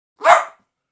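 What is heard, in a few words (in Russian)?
моей собачки; edinstvennoe zayavlenie ot moej sobachki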